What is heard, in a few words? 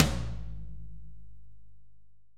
AMBTOM, ROOM AMB TOM; R.AMBTOMF2